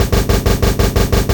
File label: Groove 180bpm; GroovePizza